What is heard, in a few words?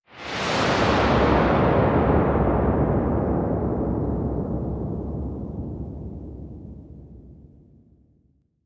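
Whoosh Rolling Thunder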